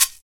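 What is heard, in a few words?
PER PROCU, Procussion, percussion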